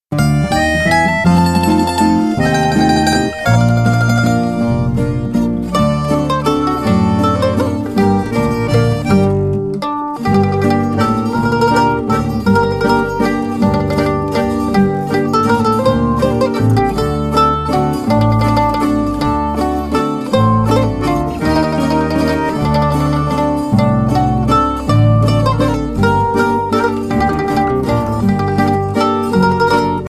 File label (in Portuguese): VALSA DO SALTO ALTO